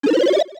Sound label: Item Pop; SM3DW